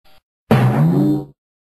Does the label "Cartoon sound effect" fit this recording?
Yes